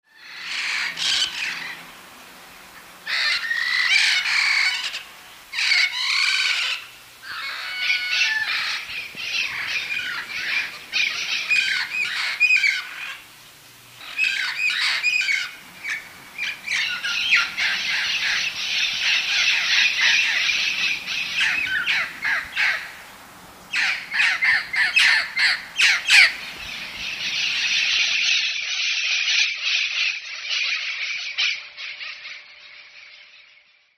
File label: parrots in trees